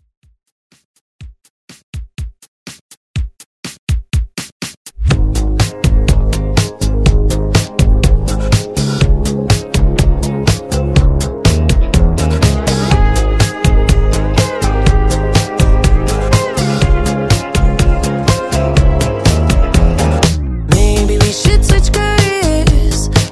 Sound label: Pop Redrum Clean